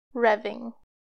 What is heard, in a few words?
revving